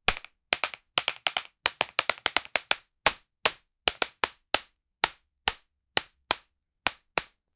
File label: rubber band shooting game